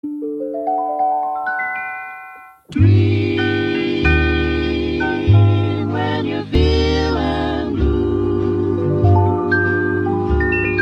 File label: Dream 120bpm B major, beats